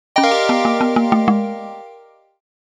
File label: Game over lose